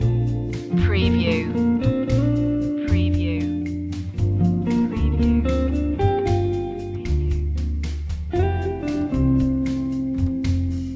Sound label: bossa melody loop; chill out music